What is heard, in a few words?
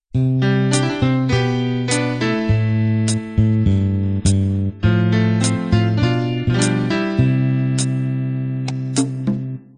natural beat intro